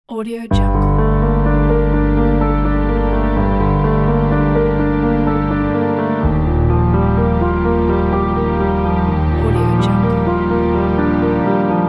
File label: sadness and melancholy